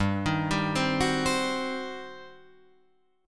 G Major 7th Suspended 4th Sharp 5th, Guitar, Drop D; down